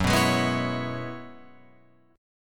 F sharp 7th Suspended 2nd Suspended 4th, Guitar, Open F; 6,6,8,6,7,8; strummed